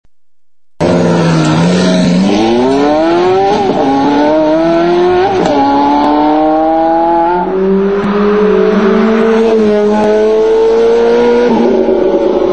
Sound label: Ferrari F430 acceleration